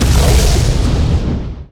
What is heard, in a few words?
Explosions Big Explosion